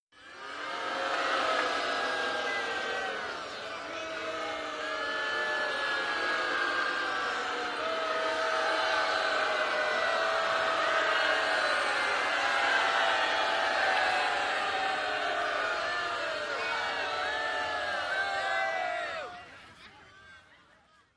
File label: Football Crowd Stea, sports arena football